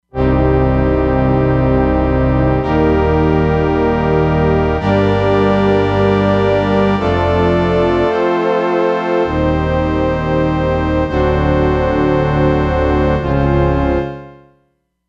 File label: Brass Pad; Factory patches